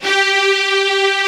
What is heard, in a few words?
STR2 SEC G3, Strings; STR SECT #2